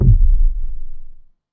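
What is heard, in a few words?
missile hit; fertig classic games; vega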